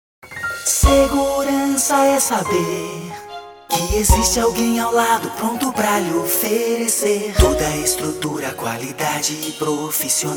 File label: jingle